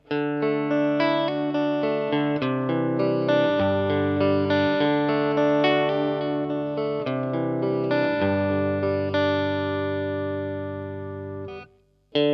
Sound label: strat; jubilee